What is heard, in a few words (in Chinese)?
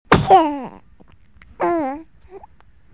婴儿打喷嚏的声音